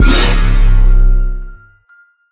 Jump Scare

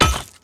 axe mining iceberg; Factorio

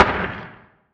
asteroid damage oxide small; Factorio

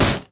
axe mining; factorio; stingfrond

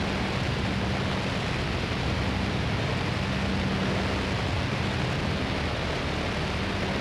thruster engine; platform thruster; Factorio

